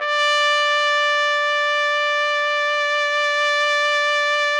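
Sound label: trumpet; Roland - LA Composer vol. 3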